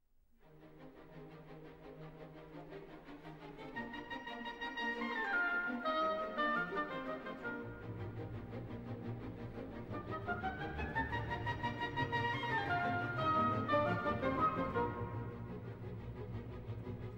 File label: Symphony in E-Flat Major